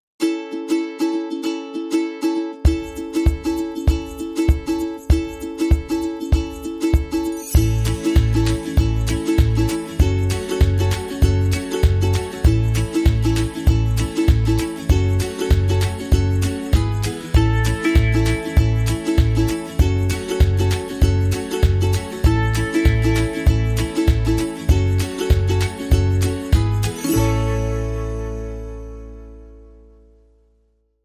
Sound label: Underscore Modern Drums